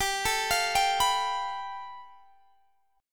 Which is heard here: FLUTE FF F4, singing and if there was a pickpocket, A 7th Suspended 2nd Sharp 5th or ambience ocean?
A 7th Suspended 2nd Sharp 5th